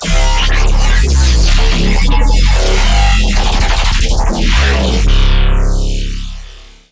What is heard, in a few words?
Bass C#